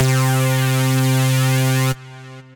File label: Fashion Keys, Synth Keys